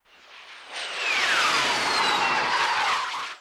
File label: MIG Sweetener, Aircraft; Vehicles